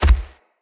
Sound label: Gallop Default, Animals, Foley, Steps; Bromius